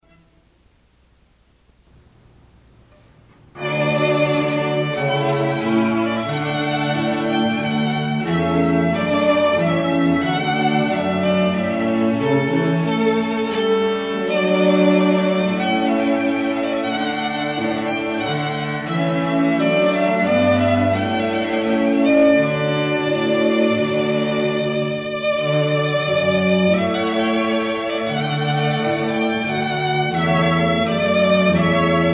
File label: String Quartet; Baltimore